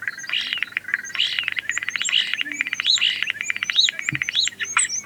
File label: starling